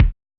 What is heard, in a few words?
Hiphop kick; Reverb+EQ+Comp+Limit